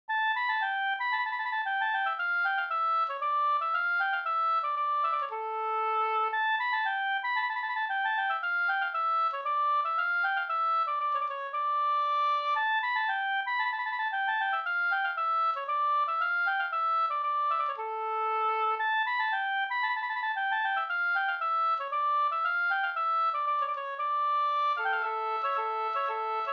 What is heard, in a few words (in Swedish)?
polska Rattvik